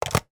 household video game controller place in charging dock; nintendo wii; sound effects; sound effects one